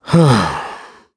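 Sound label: Vox Sigh, Korean; heroes; KaselB; kings raid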